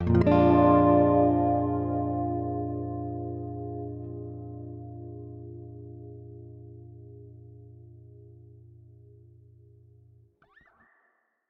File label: strum plate; eventide h9